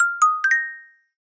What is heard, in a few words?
galaxy s9 rainbow ringtone, samsung sms ringtones